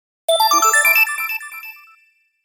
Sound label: Collect Coin Complete